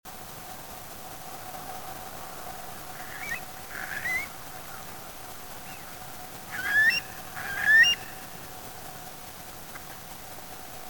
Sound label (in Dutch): Wulp Numenius arquata; lente